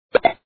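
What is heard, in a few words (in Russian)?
поп корн одиночный короткий цифровой мультяшный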